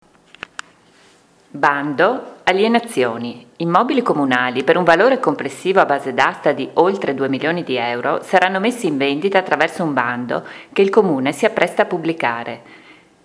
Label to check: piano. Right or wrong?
Right